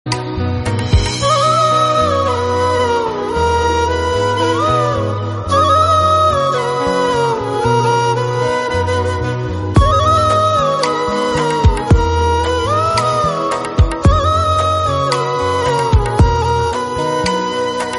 Instrumental Flute

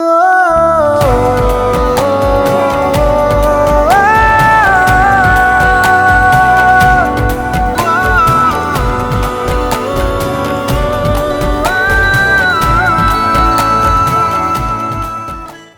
Humming

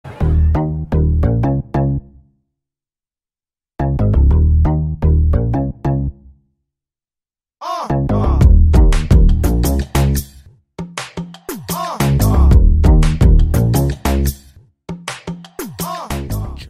INSTRUMENTAL RINGTONE

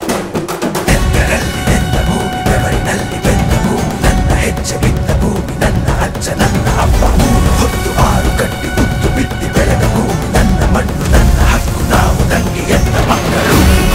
BGM Instrumental; Kaatera

